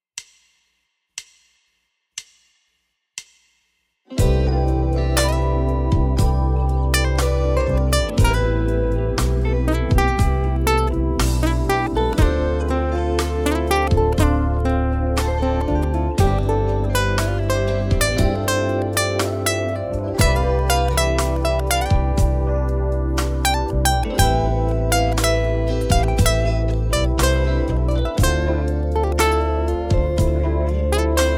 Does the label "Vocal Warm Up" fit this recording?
No